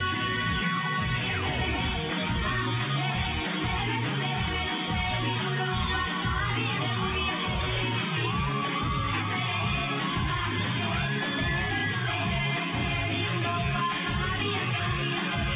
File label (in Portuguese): refrão português